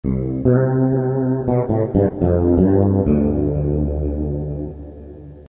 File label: fret